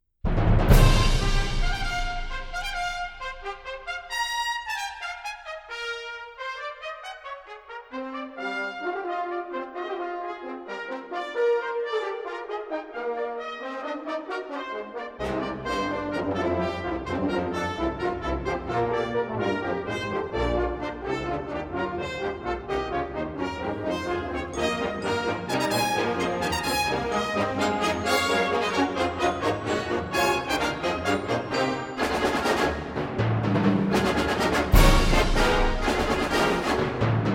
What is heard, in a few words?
Wind Ensembles; Keystone Wind Ensemble; Pageant